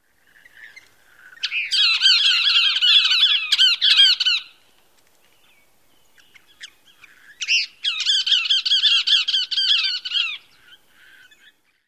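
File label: call outbursts of pair, Cassin's Kingbird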